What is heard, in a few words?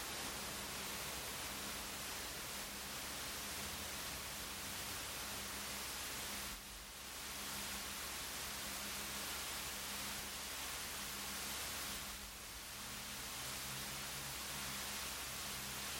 Min Fan Speed; inwin neptune dn140 pro fan